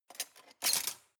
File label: cutlery large multiple drawer grab